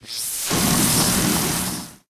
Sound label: Colorbomb Idle; Candy Crush Soda